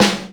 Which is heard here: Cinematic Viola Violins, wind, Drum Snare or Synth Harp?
Drum Snare